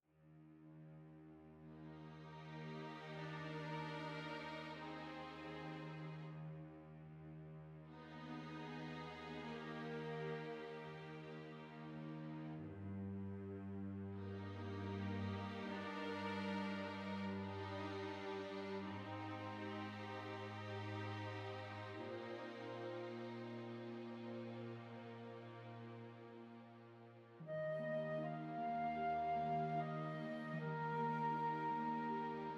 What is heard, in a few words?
Positive Ballad Scene